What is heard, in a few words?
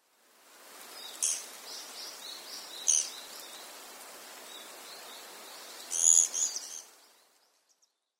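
Ryukyu Japanese Pygmy Woodpecker; kunigami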